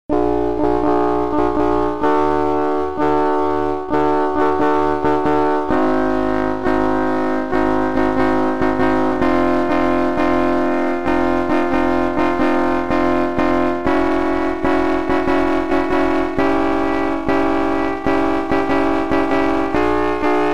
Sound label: mirachord